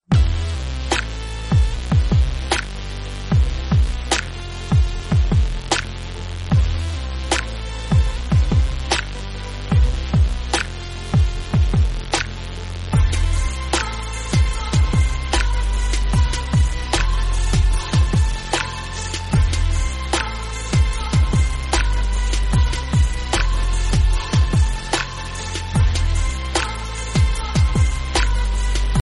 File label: gangsta beat